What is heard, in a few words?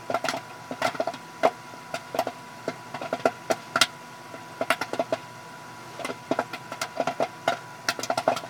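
popcorn machine loop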